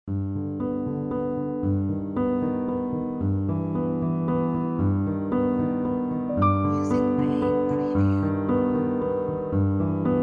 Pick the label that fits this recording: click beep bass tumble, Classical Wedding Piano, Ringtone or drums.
Classical Wedding Piano